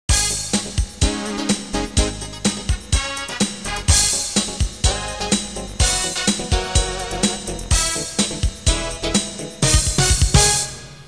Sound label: dance; QChord